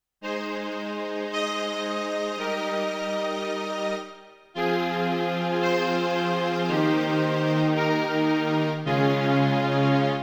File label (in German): Pianobox Streicher